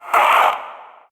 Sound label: Possessed Red Veil Vocal Pain; Warframe; PriestQuest